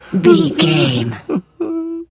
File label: voice male b cry whimper high